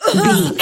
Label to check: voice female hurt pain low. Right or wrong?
Right